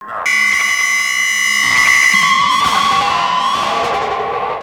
Kaos FX, Ambient Grooves

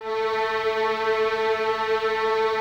violins A 3, String Ensembles, 23 Violins; Miroslav Vitous

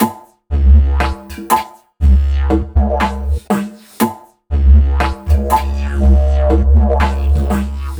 Dig Loop, Ethnic Instruments; Sonic Foundry (Sony Creative Software); The Electronic Music Manuscript Collection

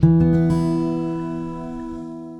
Guitar And Synth; Sonic Foundry (Sony Creative Software) - Friendly Fire

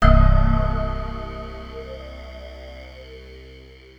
Space Bass C, One Shot Bass

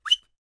WHISTL, World Voices, ANDES; Spectrasonics Vocal Planet

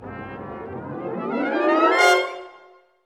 BRS RIP, LOW BRASS; Roland - LA Composer vol. 1

